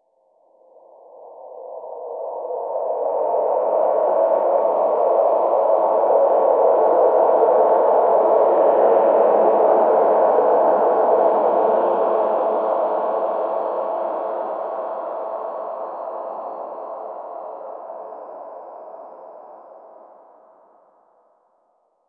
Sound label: ambience